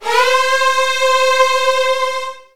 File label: Virtuoso Strings C3; ILIO; Kirk Hunter Virtuoso Strings VOL-2